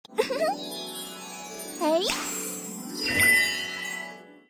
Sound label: recruit action